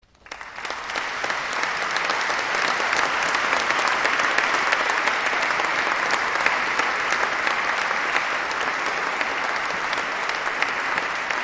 APPLAUS

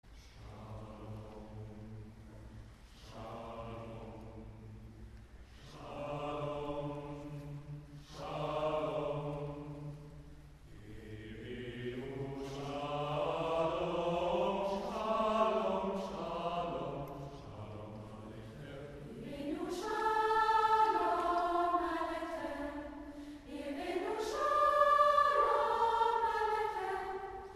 Chor und Jubilaeumschor

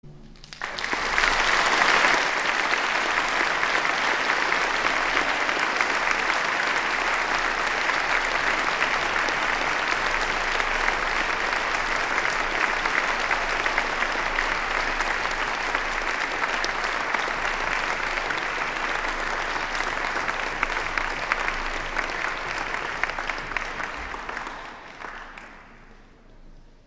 Vororchester Applaus

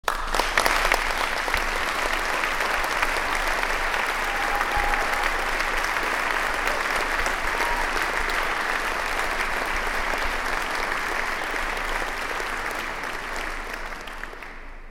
Applaus; Folkensemble Ayia Marina

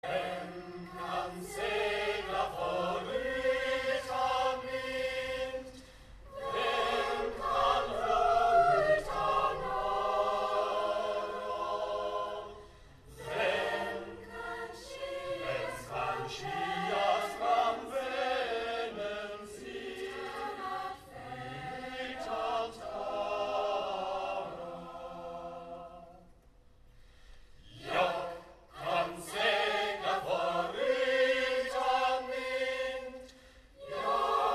Jubilaeumschor; Vem kann segla foerutan vind

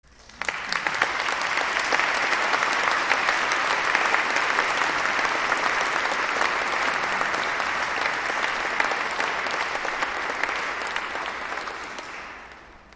APPLAUS; Chor und Jubilaeumschor; Adorar al nino; Traditional Venezuela

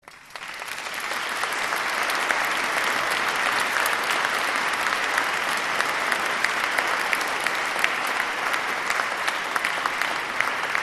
Applaus

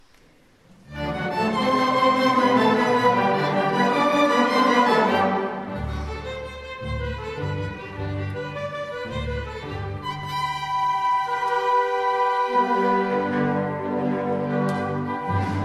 Orchester Kinderlied

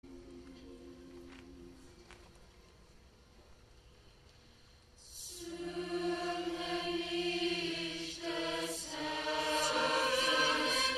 Chor und Jubilaeumschor; Spruch Robert Schumann Friedrich Rueckert

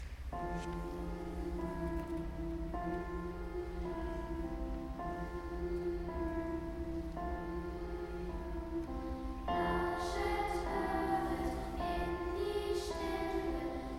Unterstufenchor; Aus Russland; Bajuschki Baju; Konzertmitschnitt vom 19. Dezember 2023

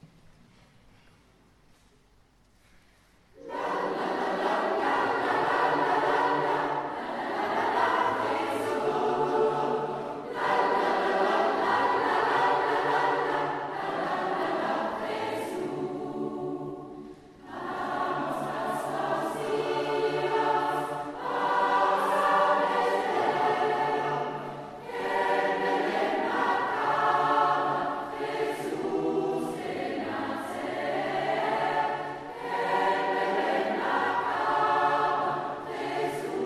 Chor und Jubilaeumschor; Vamos Pastorcillos; Traditional

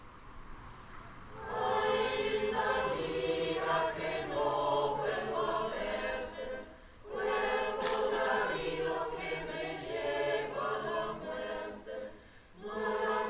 Chor und Jubilaeumschor; Anonymus; Ay Linda Miga

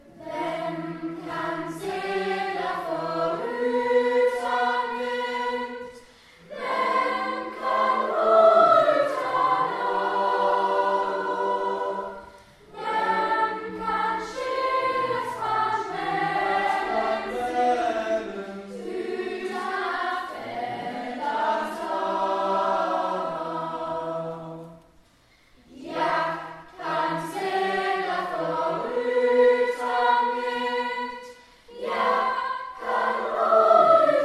Unterstufenchor und Chor; Vem kan segla; Traditional; Konzertmitschnitt vom 2. Juli 2024

